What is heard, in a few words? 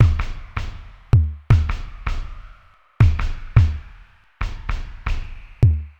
drum loop, ambient, 160; eJay